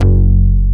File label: fat bass; Masterbits; Dance Trax